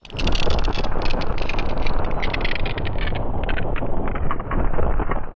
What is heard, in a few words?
Ice Ground; OOT